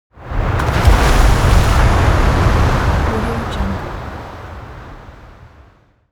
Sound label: coast oneshot surf strong; atmo; smartsoundfx